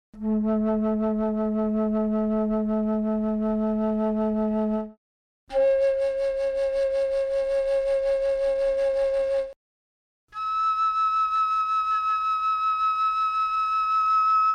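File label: flute E4, chamberlin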